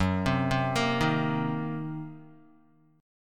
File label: Fsharp 6th Flat 5th down Guitar; F#6b5; arpeggiated; JGuitar